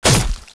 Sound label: hit bod, weapons